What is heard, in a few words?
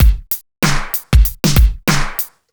old skool simple, drum loops; hh drum loops